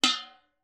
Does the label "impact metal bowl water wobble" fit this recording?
Yes